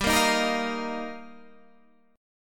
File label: G Minor 7th Sharp 5th